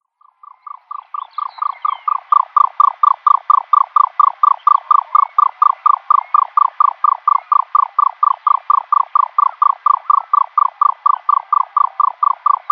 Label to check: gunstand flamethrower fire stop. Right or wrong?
Wrong